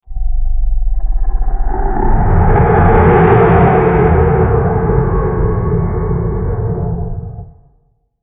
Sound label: thunderous roar demonic; abyss